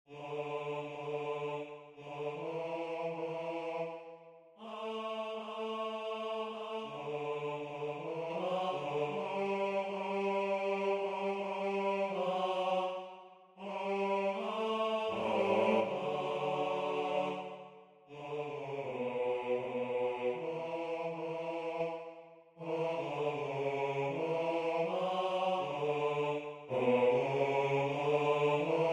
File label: Tenor; Georg Friedrich Haendel; Lascia ch'io Piango; Rinaldo - Opéra